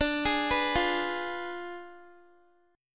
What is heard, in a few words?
D Diminished 7th, Guitar; Ddim7 arpeggiated; x,17,18,16,17,x